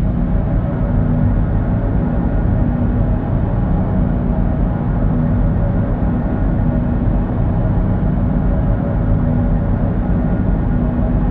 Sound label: ambient battle; World Of Warships